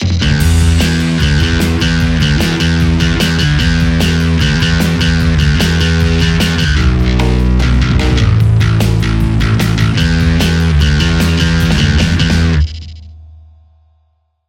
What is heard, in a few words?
drive; tse; bod